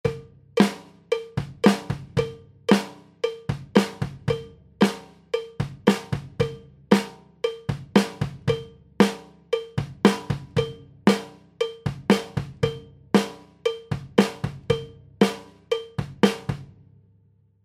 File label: PERCUSSION DRUMS